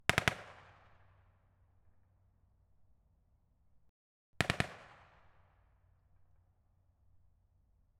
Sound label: M1919A4 Browning Machine Gun .30cal on turret in front blanks Triple shots; Pole Position Production